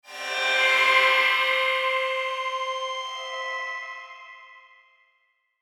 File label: SHIMMER, Shimmer Feedback Swell; High Tone; Blastwave